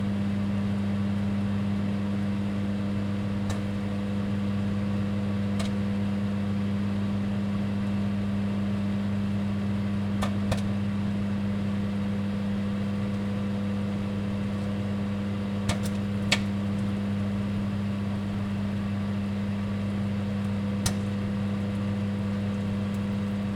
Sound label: popcorn in microwave